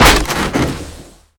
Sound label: Fumerol Cap Blow